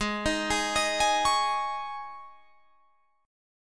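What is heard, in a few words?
Ab Suspended 4th chord, Guitar, Drop D tuning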